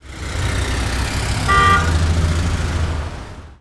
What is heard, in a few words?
HORN, City Ambience; SFX